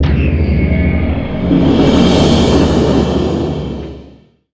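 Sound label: Colosseum Appear; Fly For Insanity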